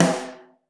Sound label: Snare Mono